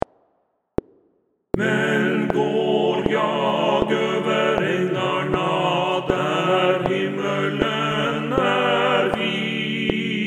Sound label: TUTTI